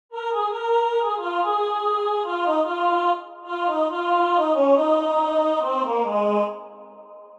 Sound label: Ooh Ooh Ooh